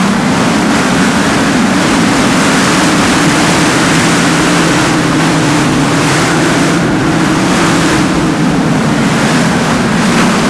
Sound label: Infested Exposed Wind; Warframe; Corpus